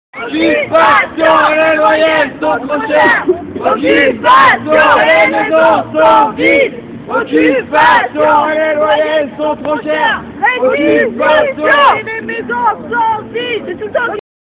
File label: Vocal